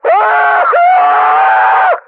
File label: death; custom monsters; mercs